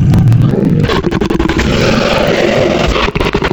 Cougar, Animals for Serum Wavetables; Synthetic's Serum Collection; Cymatics - Animals for Serum - Gold Edition Wavetables

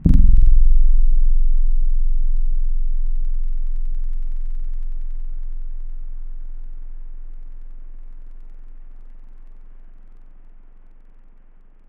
Les Paul 65 Deluxe Clean Mid Mute; Synthetic's Serum Collection; Surge Sounds - ASTRO - Serum Wavetables

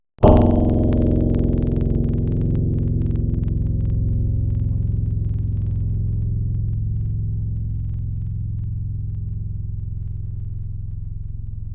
Bandura; Synthetic's Serum Collection; Echo Sound Works Sphere

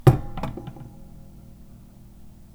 Putting Guitar Up Against The Wall

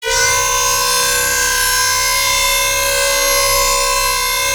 Metal Alarm, Noise Osc; Cymatics; Shapeshift

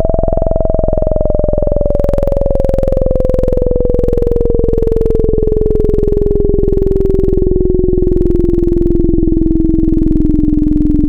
filter sweep; Synthetic's Serum Collection; BVKER - Synthwave

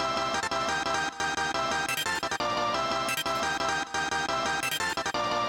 ravestab drop riff; 'Effection' samples; lolicore

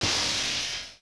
critical stop, Windows 98

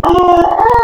Rooster, Animals for Serum Wavetables; Synthetic's Serum Collection; Cymatics - Animals for Serum - Gold Edition Wavetables

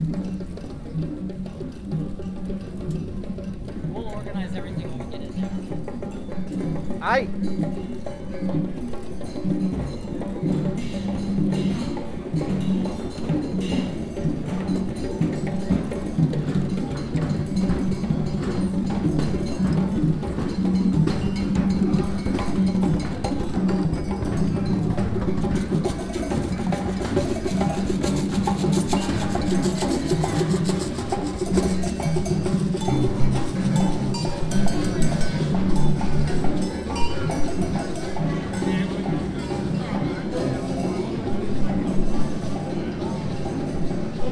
People Drumming, Chinatown & Flea Market

